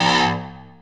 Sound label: stab; Dirt-Samples